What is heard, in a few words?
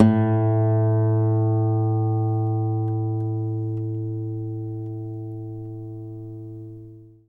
GTR A1 HARD, Nylon Guitar